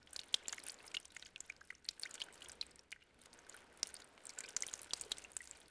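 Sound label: water trickle loop; water splashes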